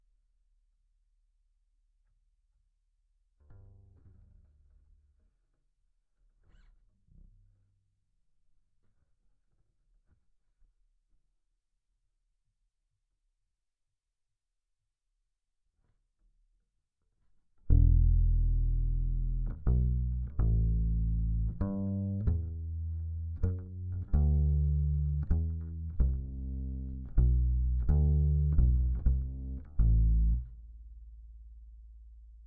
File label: Bass DI